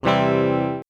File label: c4 guitar